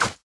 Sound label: Elite harassers Arrow storm Single; Elves